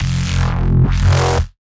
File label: Bass F; Neurobots